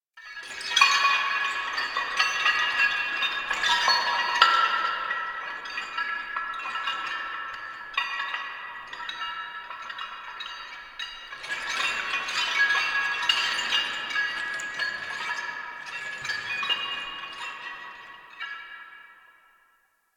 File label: Dungeon Island Chains, Ambience; Warframe; Duviri